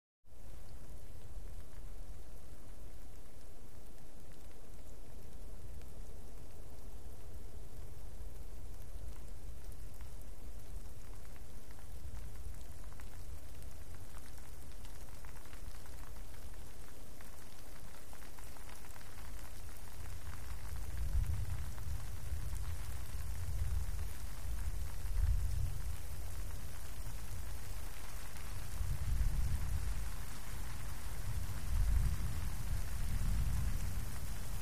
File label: Rain Swell On Pine, ext